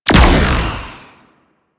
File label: Space Fighter Fire; Space Fighter Gox Agent